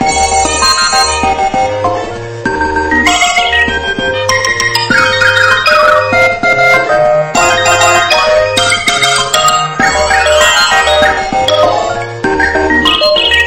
Concert Phone

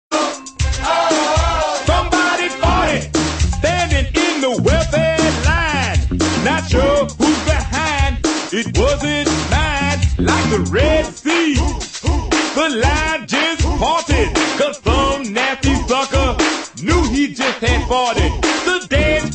Somebody Farted

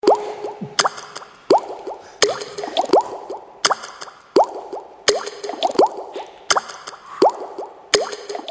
C00l Water Drops

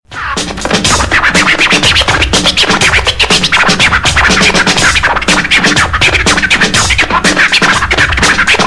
Scratching

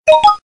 Twinkle

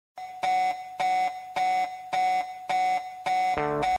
Sms Alarm

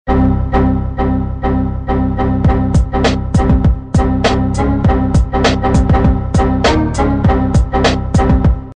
Windows Error Song

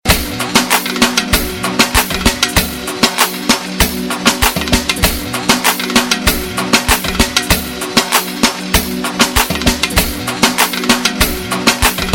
BASS RITM MANEA

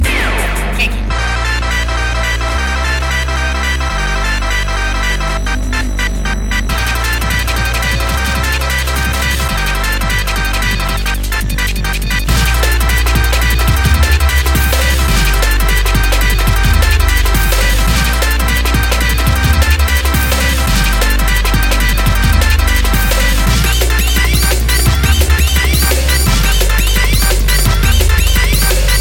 Dnb

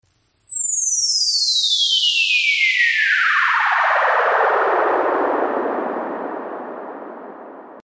Cool Sms

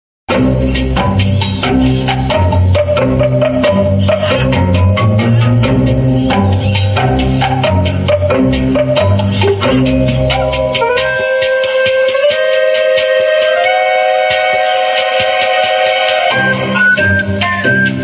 Jungle rhythm

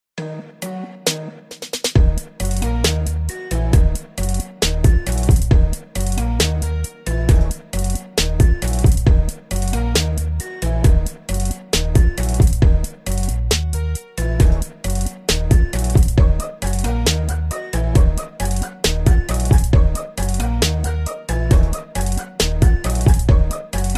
beat

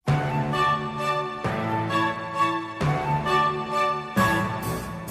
Waltz; Elder Tales

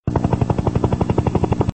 Helicopter

